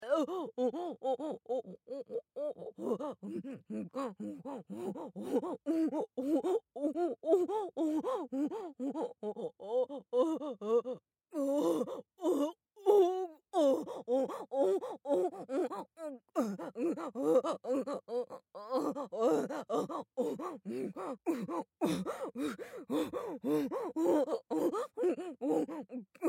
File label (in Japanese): おほ声